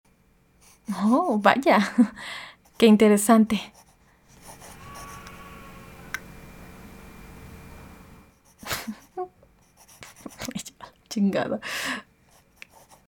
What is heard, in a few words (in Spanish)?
grito mezcla